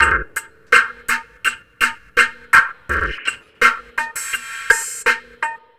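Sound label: Lo Fi Drum Loop 083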